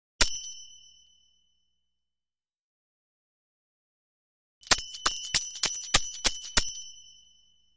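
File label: Jumbled Bells 2 Cymbal, bells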